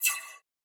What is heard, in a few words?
scifi weapon blaster accent